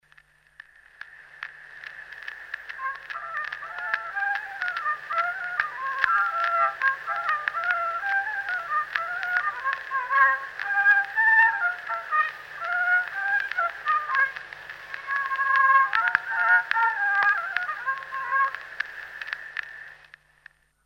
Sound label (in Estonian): Vingerpolka Kuusalu viiul folk; Eduard Aaman